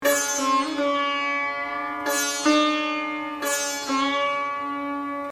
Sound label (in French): sitar son